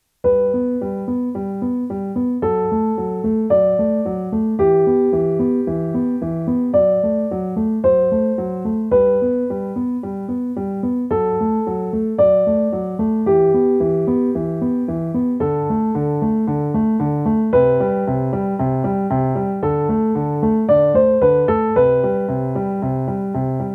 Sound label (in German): Kaminfeuer ohne Pedal